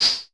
HH, Vermona Mono15 Drums

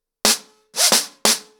90 BPM, acoustic drums, drumloops; The Roots Drumloops

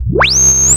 synth, c, Techno Synths; Dance Synths

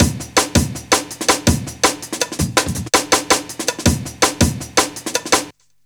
Boo Worm Break, Loops; m8 breaks

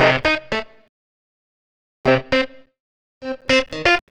phunk keys; Sonic Foundry (Sony Creative Software); Ugly Remnants VOL-2

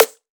snare lighter

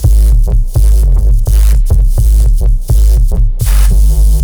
Dist LFO Tones (Rythm); neuro; BIG5AUDIO

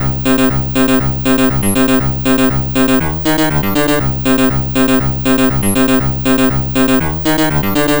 Apple II Bass, Chiptune

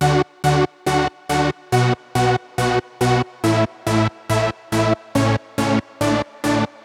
140BPM D; Vengeance - Essential Clubsounds Vol.4

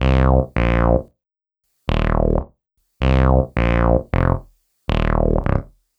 Acid Bass; Sonic Foundry (Sony Creative Software); Knutrix Drum & Bass

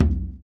surdo; ILIO; Ethno Techno

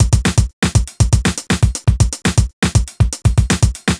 120 beat full, Nu Jazz House; PowerFX

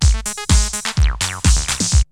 LOOPS, TECHNO; Syntec; Wall of Sounds; TECHNO-BOX

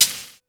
HAT, Hip Hop

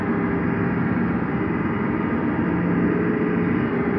sparse soundscape samples; ReLooped

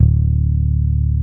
BAS; Best Service Gigapack 2

